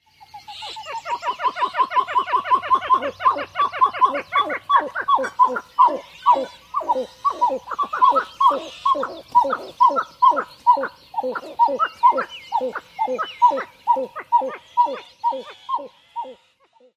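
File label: song duet, pied-billed grebe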